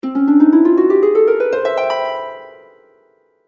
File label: Harp Ascending; Musical Sound Effects; audio logo, web background, video game music